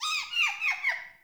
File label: Freed Beaver Call; Encounters; Animal Rescue